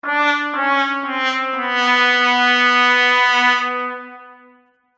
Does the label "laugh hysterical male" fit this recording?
No